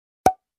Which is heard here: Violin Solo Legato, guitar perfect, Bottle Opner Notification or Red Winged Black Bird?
Bottle Opner Notification